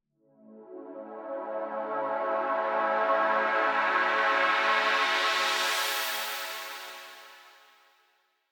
Pad; Dubstep

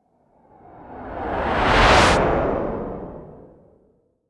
Whoosh; Whooshes

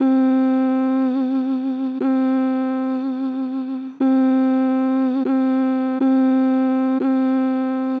Vib Mmm, Vocals, Natural Vibrato Strong; Harmor Vocals

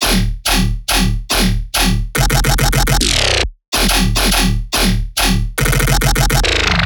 Riddim Bass Loop E Drill (140); Ghosthack; Riddim Essentials 2020

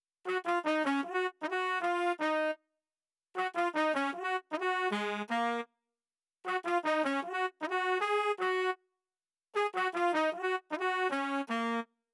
Classic Melody Loop 155 BPM D# Min Trumpet; Loop Stems; Cymatics; 2020 Melody Collection

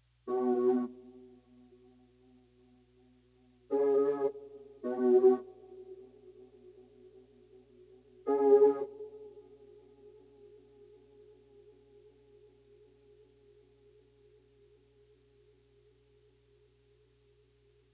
Dark Melody Loop - 105 BPM F# Min Low Synth; Melodies; Loop Stems; Cymatics; Oracle Sample Pack

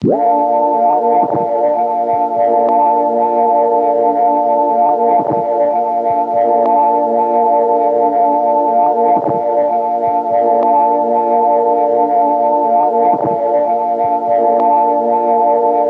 Tape Loop Pad - A# Maj; Tape Loops; Pads; Cymatics - Eternity Sample Pack

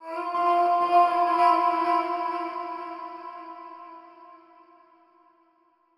130bpm Am, Vocalpack

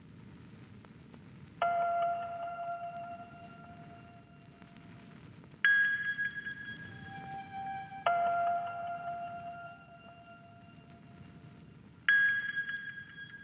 149 BPM F Min Bell Accent; Loop Stems; Cymatics; 2022 Melody Collection Platinum Expansion - Pt 2; Pearl

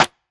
Rimshot, Snares; Drum One Shots; Cymatics; Eternity Sample Pack

